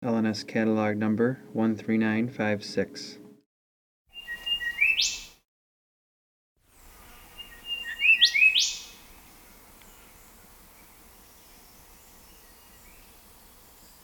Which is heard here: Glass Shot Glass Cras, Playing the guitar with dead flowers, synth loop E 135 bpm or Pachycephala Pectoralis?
Pachycephala Pectoralis